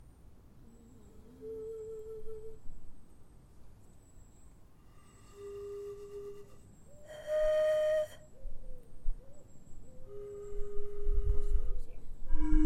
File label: pipes one at a time; Gagaku Dice; exeter community family orchestra